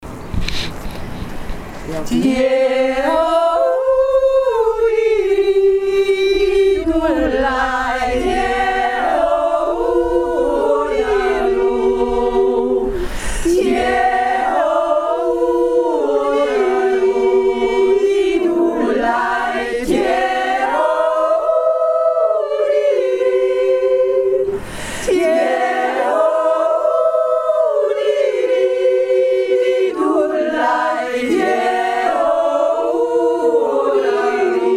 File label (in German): wolfsjodler